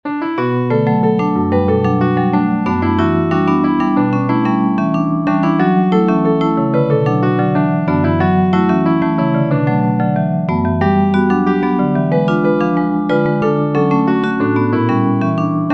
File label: salsa